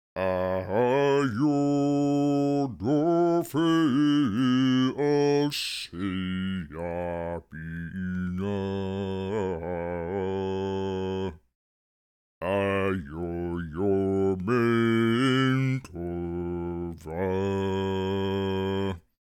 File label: sing